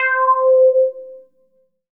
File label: Dance Floor Bass, Multisample Basses